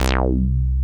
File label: MONO POLY, BS